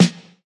steel snare drum sample f key, Royality free snare sound tuned to the F note.; snare-drum-samples